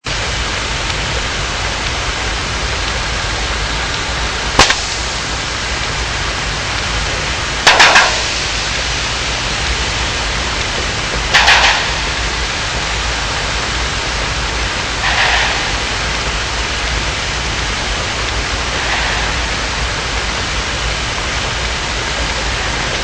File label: household light bulb